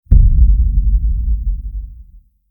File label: Deepest Hit Deep; Ninja Tracks